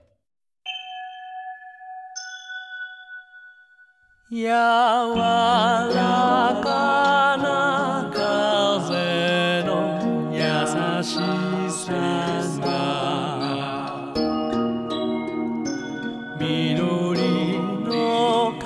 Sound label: Cello's Light ~Calm~; Claire; Promise of the Gentle Breeze; Ar tonelico Hymmnos Musical; Affection